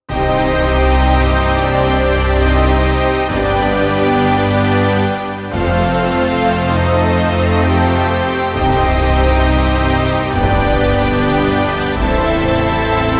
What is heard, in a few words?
Movie Brass